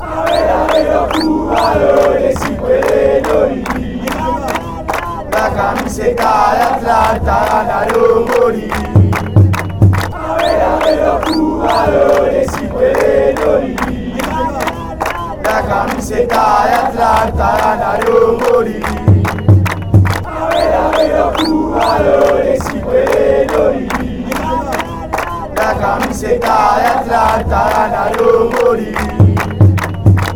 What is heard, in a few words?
chant, argentina; atlanta